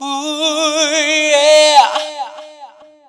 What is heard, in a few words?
yeh; awe32